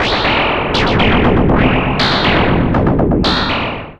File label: FX Rubble, Synth SFX; Sonic Foundry (Sony Creative Software); The Electronic Music Manuscript Collection